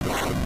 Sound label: flame; Super Smash Land